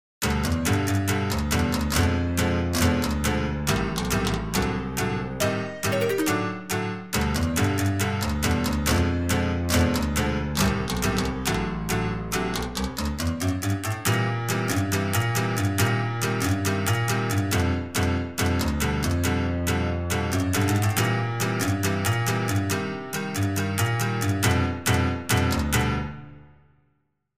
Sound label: plucked piano